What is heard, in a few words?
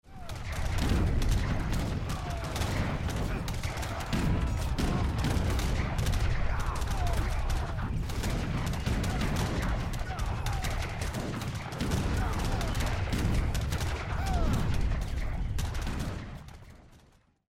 Battle; Age of Empires III; cinematics